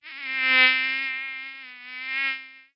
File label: Mosquito